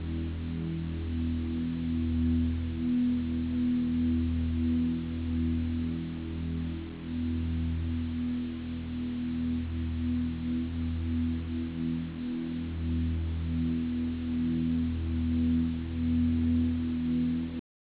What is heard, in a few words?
music